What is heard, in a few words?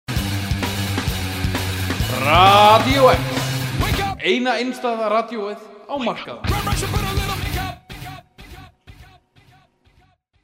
jingle; Radió-X